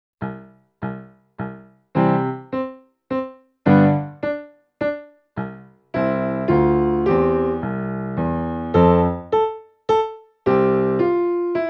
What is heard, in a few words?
Quarter Notes